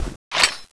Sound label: charge, weapons; cso; thunderstorm